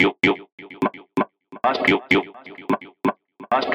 VOX LOOP

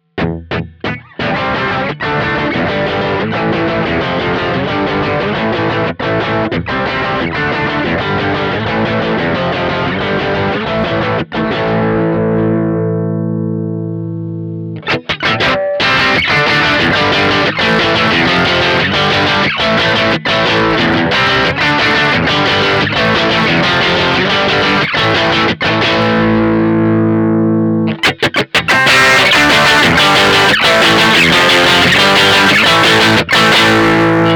rockriff tone; vox mv50 ac test

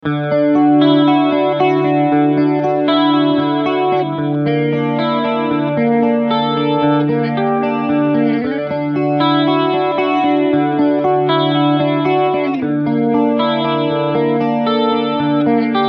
mxr analog chorus

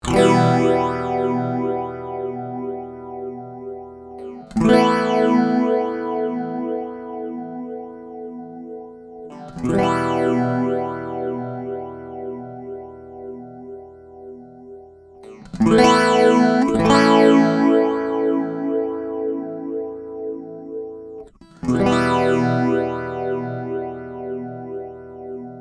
Zoom A3 phaser

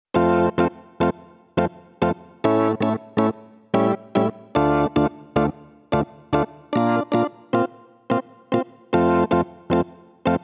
Casio SA 80; 18 Organ

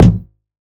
Kick

